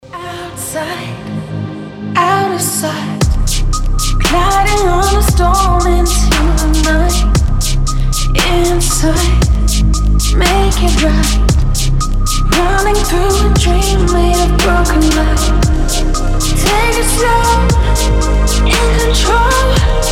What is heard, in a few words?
dubstep lullaby